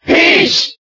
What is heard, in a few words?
Cheer German; Peach; SSBB